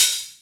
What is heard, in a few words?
TR kit, techno kit; Pro-Rec - Synthsonic